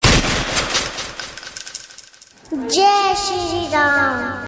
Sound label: jai shree ram message tone